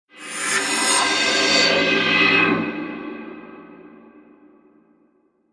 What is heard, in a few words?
Horror Cymbal Reverse Reverb; Production Element; Scary Soundscape Sound Effects